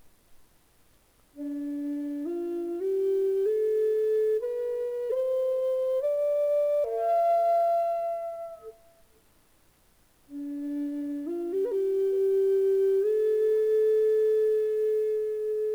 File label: curly cedar low d, flutes